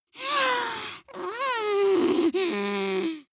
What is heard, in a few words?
witch moan surprised; kreaturen